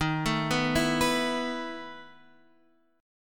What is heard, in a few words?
E Major 7th Suspended 4th Eb, chord